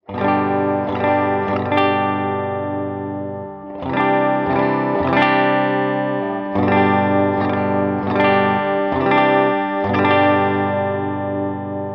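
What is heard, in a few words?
bridge clean, custom pickups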